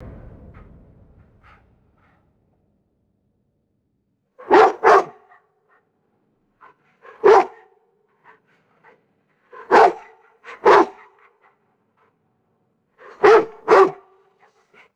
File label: distant deep gruff dog barks and breathing; animals